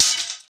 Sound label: wood breaking; wood metal SFX